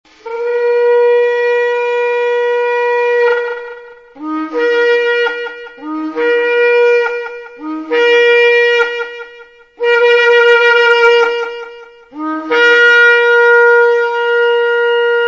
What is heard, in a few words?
shofar blasts; Blessing Cards